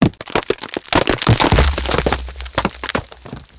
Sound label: rocks; duke nukem total meltdown